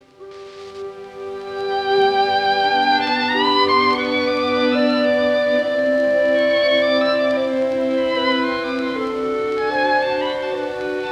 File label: Violin; Beethoven Violin 1; Berlin State Opera Orchestra